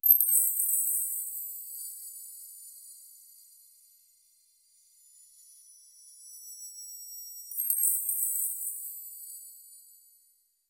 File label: clean modern, finance